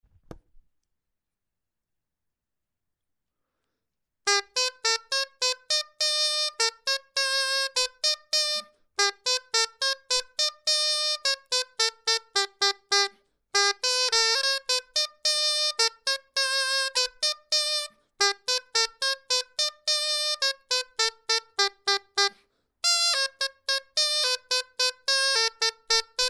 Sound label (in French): clarin etude